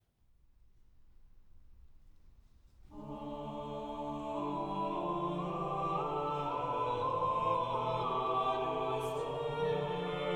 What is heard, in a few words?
Agnus Dei, for 5 voices; Forestier, Mathurin